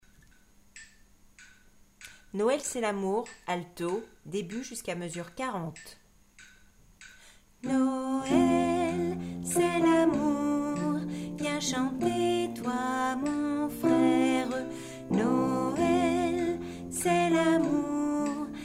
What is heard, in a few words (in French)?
alto